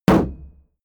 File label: shield sheet impact dent